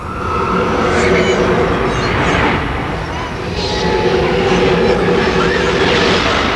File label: swarm